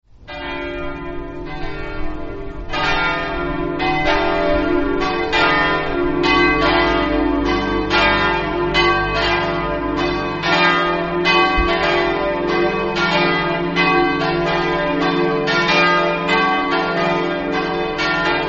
vilegnove, campane

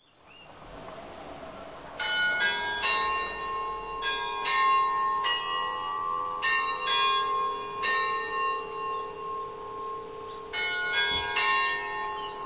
montesanto, campane

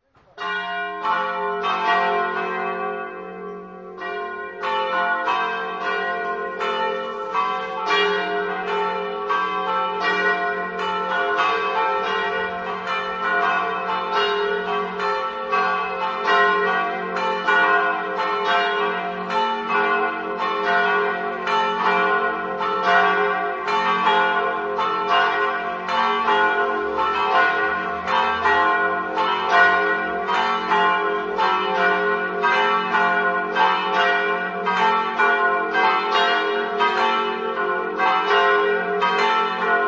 gradisca, campane

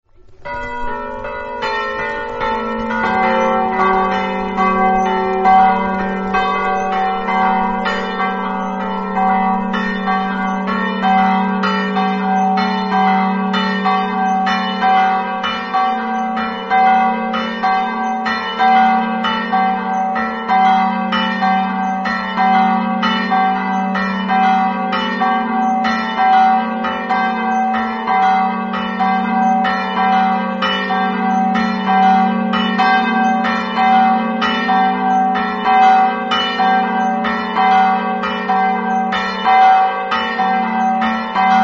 oborza, campane

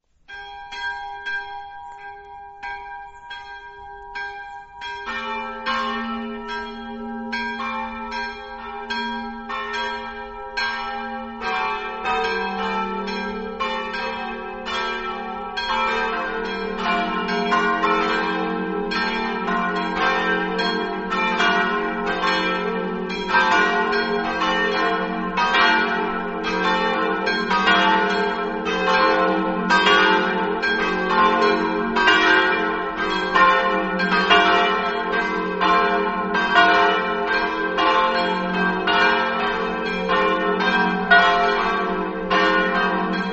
gradisca, campane

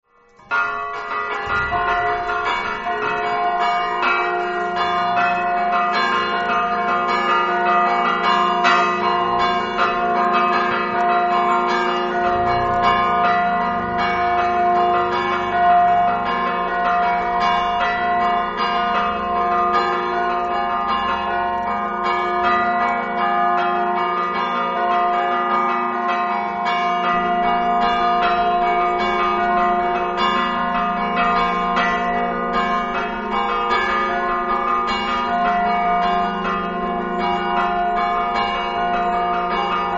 dolegna, campane

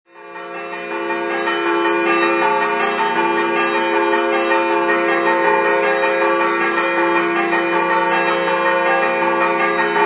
joannis, campane

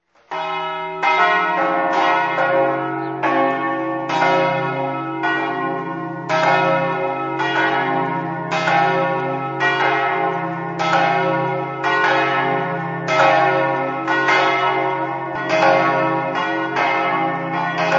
podgora, campane

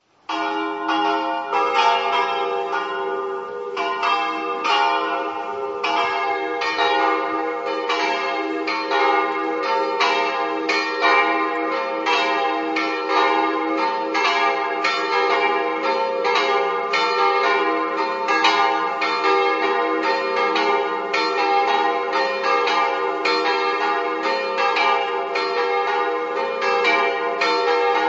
sagrado, campane

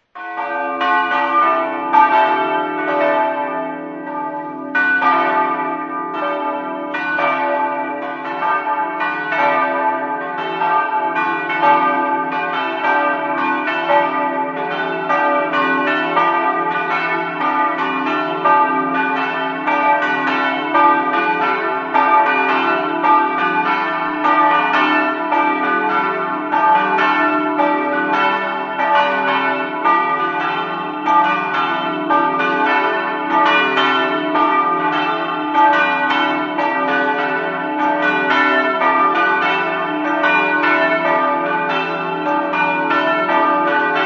capriva, campane

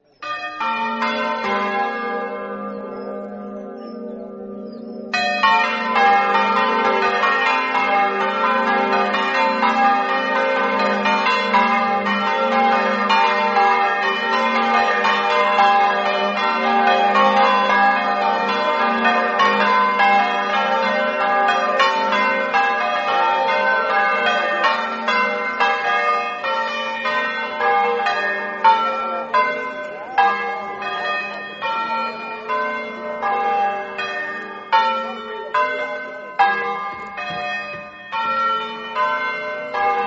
scampanottata, campane

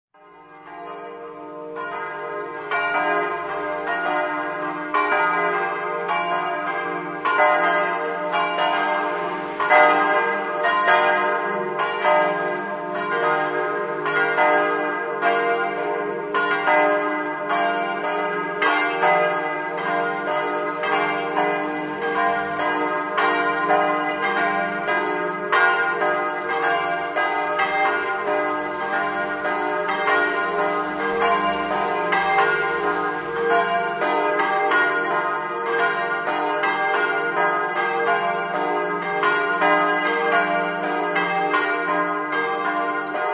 basepente, campane

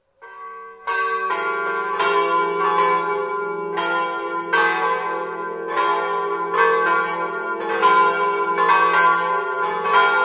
palma, campane

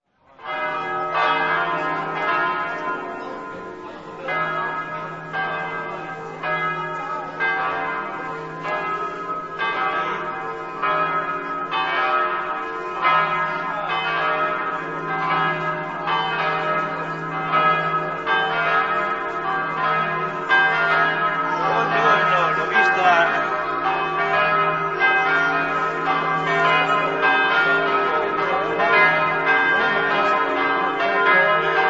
marano, campane

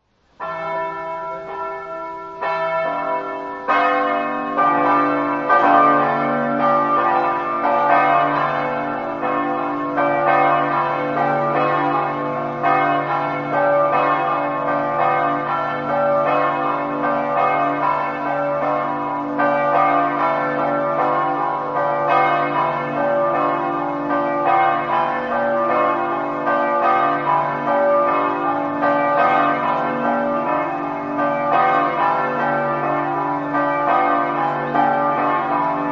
kobarid, campane

